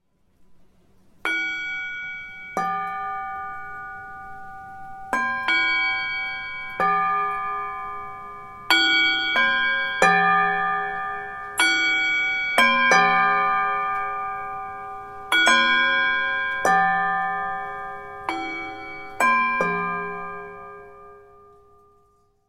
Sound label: gong